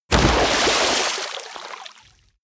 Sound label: body fall water harsh shallow; krieg-waffen; nahkampf; soundpool